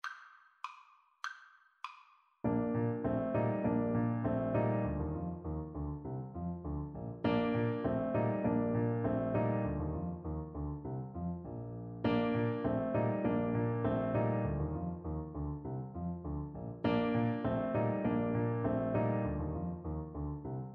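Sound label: bach musette alto saxophone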